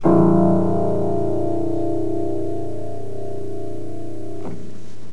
Piano Lowest

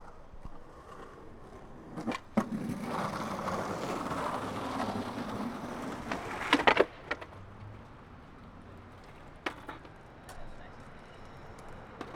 Skateboarder

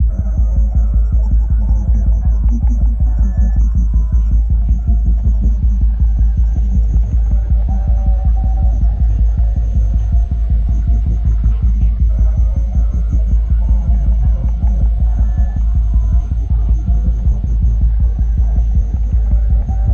Suspensful Ambient Trance Background

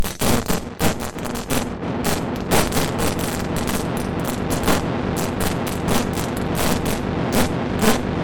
Spark Electric

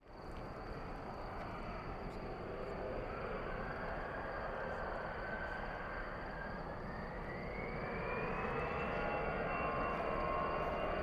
Small Airplane Takeoff

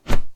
Punch

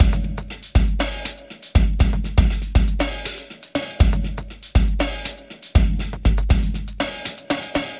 Ambienza Beat loop 120

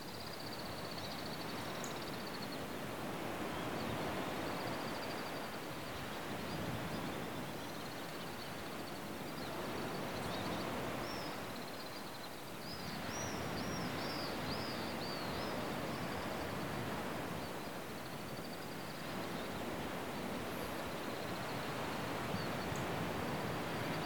Sea And Birds; Cala Fuili

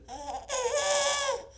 Baby Voice

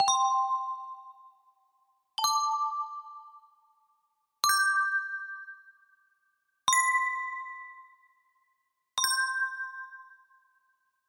Collecting Coins

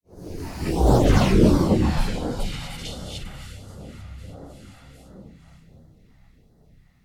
Rotating Hissy Whoosh Passing

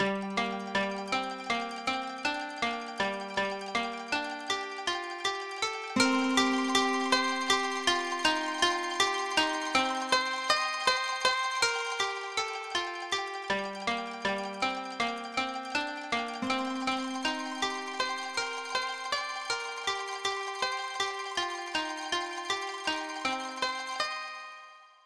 Koto Theme

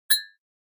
Wink

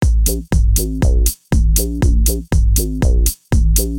Acid house bass 120

